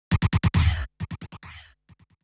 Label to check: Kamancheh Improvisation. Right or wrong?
Wrong